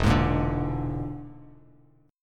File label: E 7th Flat 5th, down, Bass Standard; E7b5 strummed; JGuitar